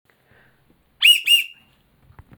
lead; jammer

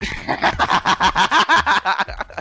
laught

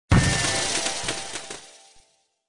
confetti; sims 2; growing up

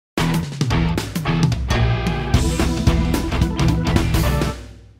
fail bumper

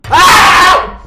scream; forsen

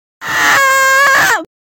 goofy ahh scream

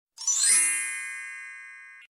fairy ding

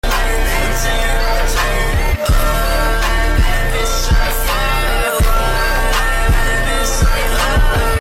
music super cool

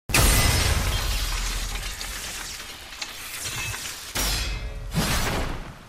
sword battle clashing; anime

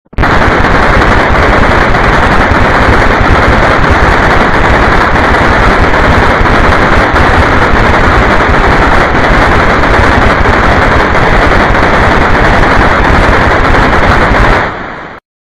minigun firing

